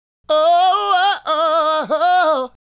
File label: Male Solo, Hip-Hop and RnB Vocals